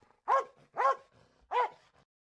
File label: Dogs